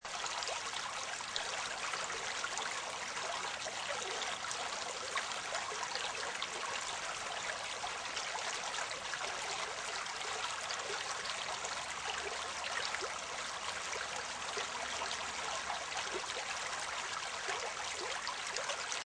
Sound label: Water Brook Loop Long, Water stream, small brook running. Seamlessly looping sound, long version.; Rivers